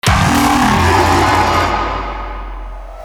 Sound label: growl